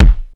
Bang Kick

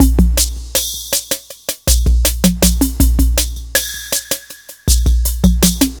Smooth, R&B Drums

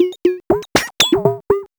POLYMOD; AMG - Vince Clarke Lucky Bastard

